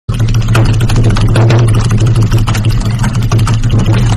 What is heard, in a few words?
keyboard meme sound